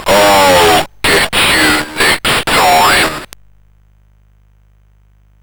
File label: sid CSG8580R5; Out on a Limb